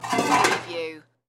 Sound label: Pots Scrape